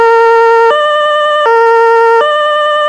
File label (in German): notarzt stadt, German sirens